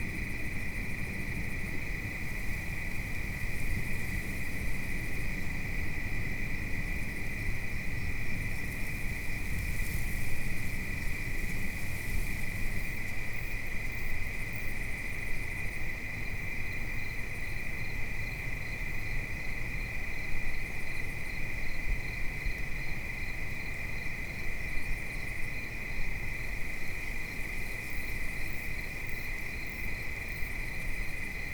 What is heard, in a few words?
Garden Ambient Loop; whispering willows